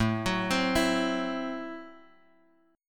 A Minor 6th A, Chord; Am6 Chord {5 X 4 5 5 X} arpeggiated

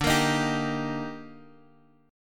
D sharp Major 7th D

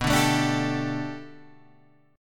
B Minor 7th Sharp 5th B, chord; Bm7#5 Chord {7 5 7 7 8 5} strummed

